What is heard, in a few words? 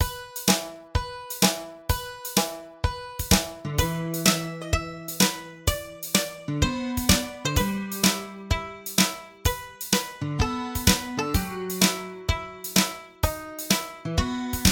Normal Forlorn Horn